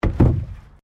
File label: PLASTIC BARRICADE IMPACT